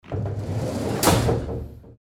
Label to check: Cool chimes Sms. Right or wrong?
Wrong